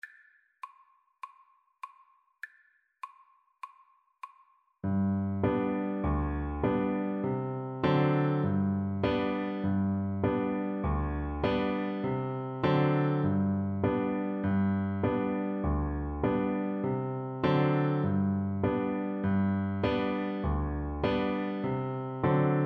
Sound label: alouette, recorder